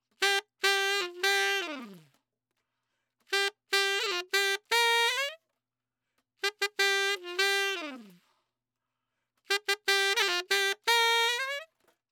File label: Trad Jazz Sax, Gmaj; Brass; Digidesign; Pro Tools; Pro Tools Essential Loops